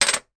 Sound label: office small filing cabinet key drop on filing cabinet top